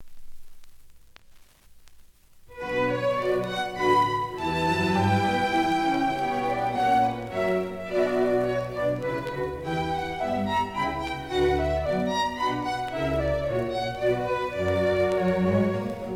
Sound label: Minuet; Bach; Suite No.2 In B Minor, Bwv 1067